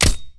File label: katana